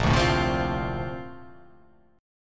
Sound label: Bb Major 9th, Bb, down; strummed; JGuitar